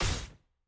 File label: medium; samira